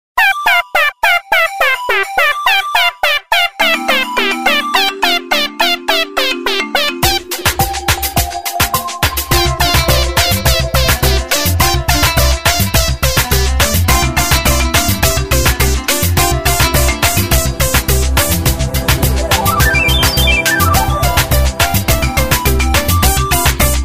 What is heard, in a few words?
Ringtone, Samsung GT-C3510